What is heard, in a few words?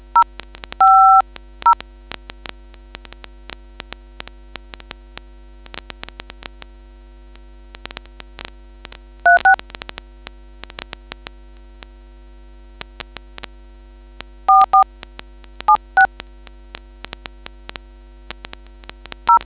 dtmf